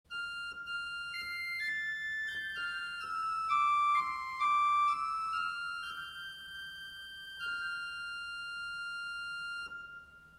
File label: Superoctave 2